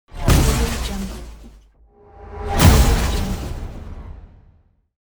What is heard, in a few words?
explosion